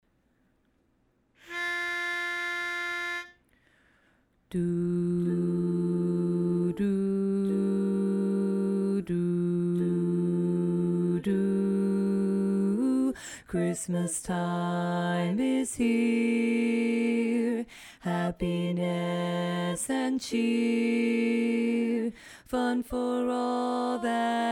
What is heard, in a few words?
Bass Dominant